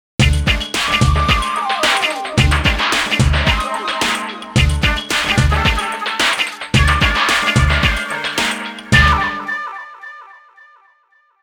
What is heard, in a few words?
BGM Rhythmical